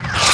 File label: combat atmosphere; World of Tanks